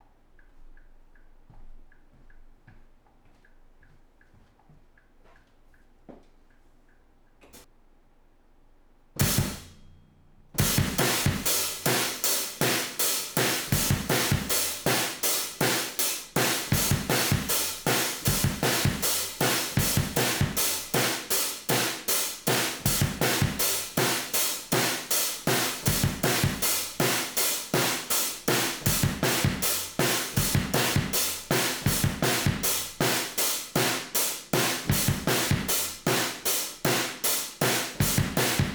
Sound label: drum room compressor-B; slate-ik